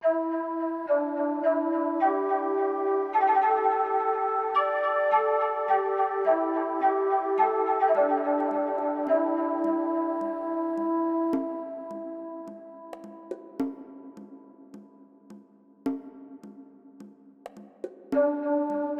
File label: Tribal Welcome, music